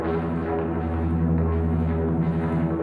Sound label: Hondo Fuzz FX 170 E, guitar drone samples 170bpm